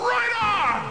fall; quake2; turtle

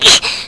jump; quake2; hottie

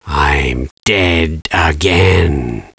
death; quake2; caleb

